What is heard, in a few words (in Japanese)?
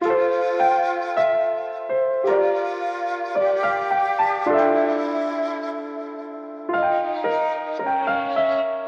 lo-fi メロディ